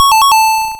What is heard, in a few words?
8 Bit Correct Answer